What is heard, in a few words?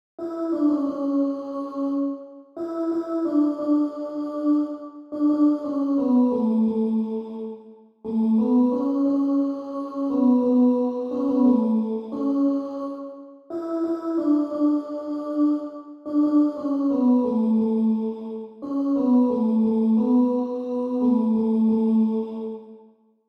Treble ALTO with other voices; audition excerpt